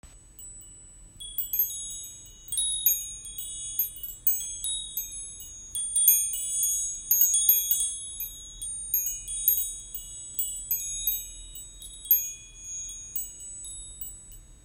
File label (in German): Klangspiel Kristall